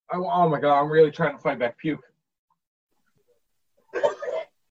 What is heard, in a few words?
puke